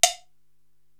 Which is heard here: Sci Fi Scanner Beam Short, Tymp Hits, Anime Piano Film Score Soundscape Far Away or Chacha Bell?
Chacha Bell